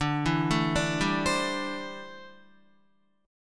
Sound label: D 7th Suspended 2nd Suspended 4th D, Guitar; D7sus2sus4; arpeggiated; 9,7,9,7,7,8